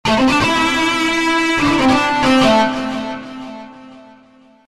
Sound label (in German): signation gitarre kurz